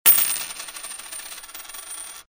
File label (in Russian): brosajut srednie monety na stol